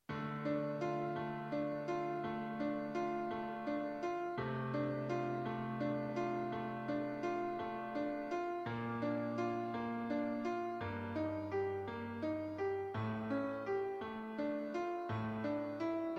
Moonlight Sonata, Piano Solo; Ludwig van Beethoven

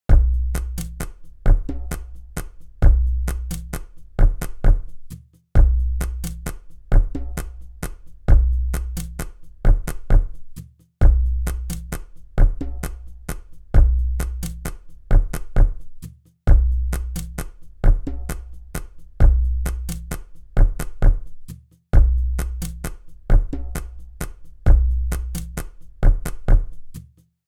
Fandango, compas flamenco guitar

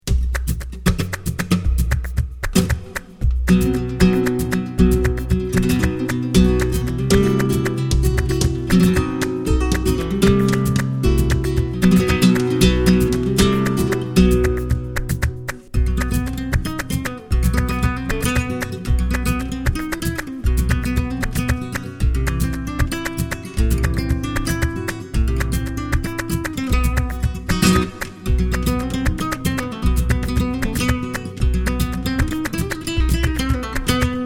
Buleria Guitar, flamenco

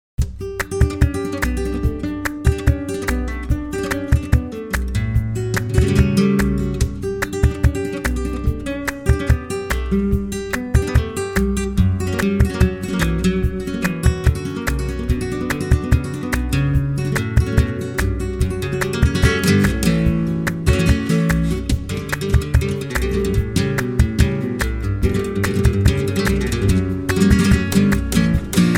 Tangos Guitar, compas flamenco guitar

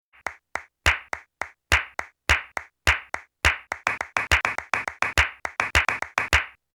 buleria, compas flamenco guitar

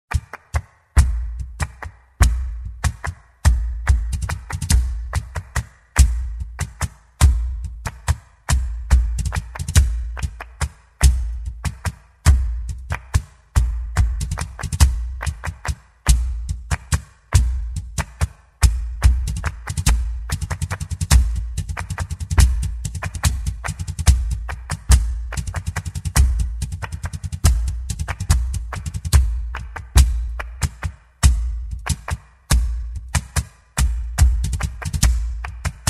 compas flamenco guitar soleares

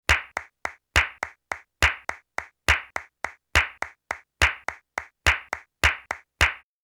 buleria, compas flamenco guitar

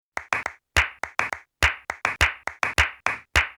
buleria, compas flamenco guitar

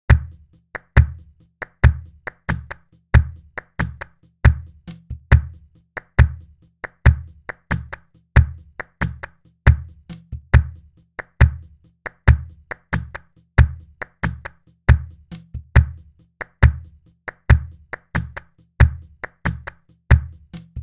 Siguiriya, flamenco guitar

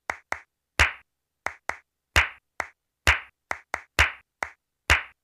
solea, compas flamenco guitar

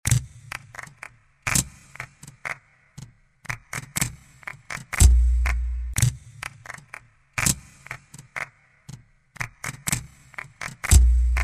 BULERIAS, rhythm solo compas flamenco guitar

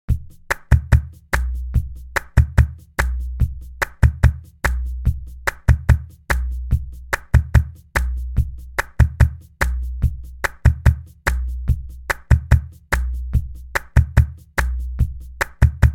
Tangos, compas flamenco guitar

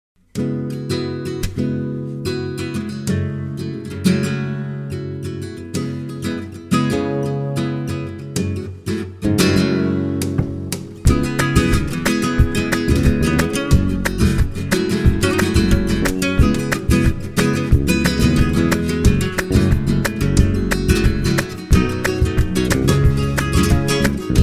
Rumba Guitar, compas flamenco guitar

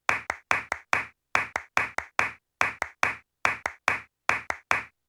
alegria, flamenco guitar

